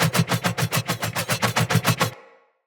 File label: Sphere Board Throw Loop; Weapons; Swords; Sphere Sword And Board